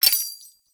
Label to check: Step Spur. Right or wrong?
Right